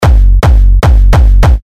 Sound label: Kick F#; Atlas; terra kicks; Revealed Terra Kicks